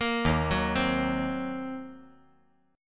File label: D sharp Minor 6th D sharp down; arpeggiated; JGuitar